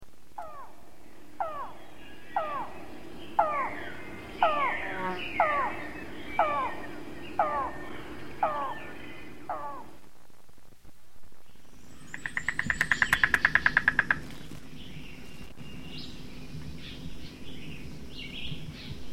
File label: yellow billed cuckoo